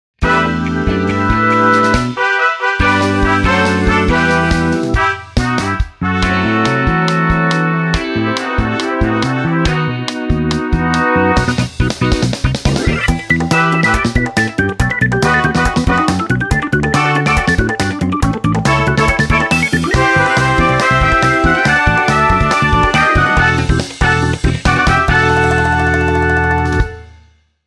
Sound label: jingle